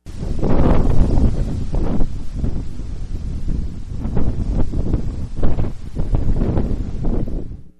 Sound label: wind nat sound